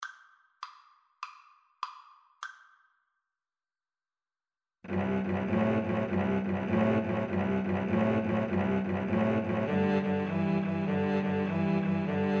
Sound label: midnight special, Violin Cello Duet